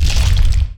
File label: portal open red rock; weapons; portalgun